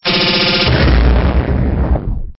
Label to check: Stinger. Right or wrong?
Right